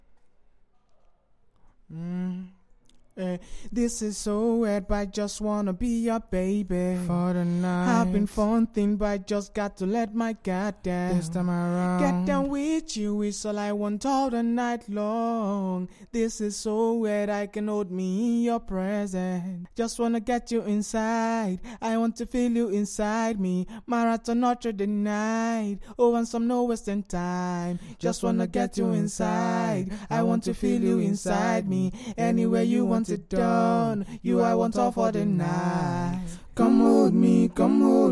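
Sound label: pop, 58, B flat minor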